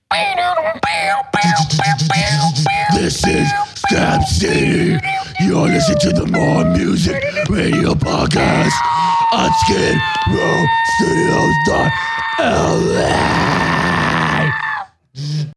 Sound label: deathmetal